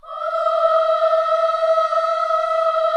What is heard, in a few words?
KIDS CHOIR, Gospel